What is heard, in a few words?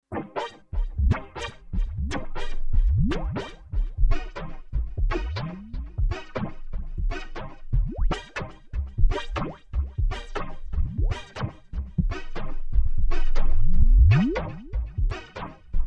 Techno City